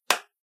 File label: clap